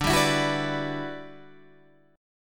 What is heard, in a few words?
E Minor 9th D, down, Guitar, Standard